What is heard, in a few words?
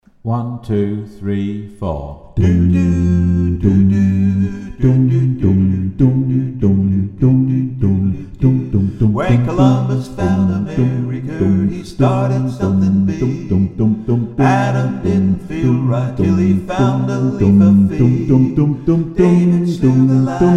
Bass